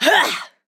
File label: Grunt Attack; Inara